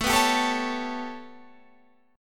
B 7th Suspended 2nd Sharp 5th A

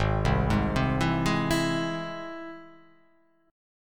G sharp Augmented; 4,3,6,5,4,3,6,x

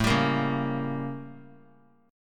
A 7th Flat 9th; A7b9 strummed; JGuitar

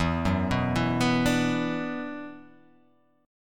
E 7th Suspended 2nd E down, guitar

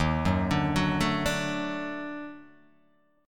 D sharp Minor Major 7th Flat 5th; x,6,4,7,6,4,4,x; chordshape

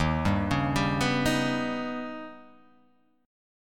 Eb Augmented 7th Eb down; Eb+7 arpeggiated; JGuitar

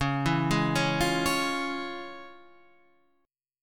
F Minor Major 7th Sharp 5th, C sharp; FmM7#5/C# arpeggiated

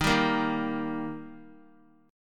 Eb Major, down; strummed; JGuitar

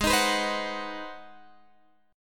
G sharp 7th Sharp 9th, Guitar, Open G

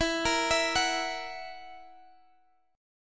E Suspended 2nd Flat 5th, Guitar Standard; Esus2b5; arpeggiated; x,19,20,21,19,x; down; capo=3

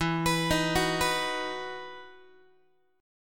E Major 7th Suspended 2nd, Guitar; EM7sus2 arpeggiated

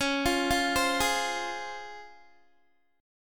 C sharp Major 7th C sharp Guitar; C#M7; arpeggiated

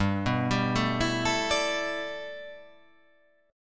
G 6th Add 9th

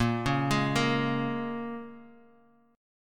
Bb Minor 7th

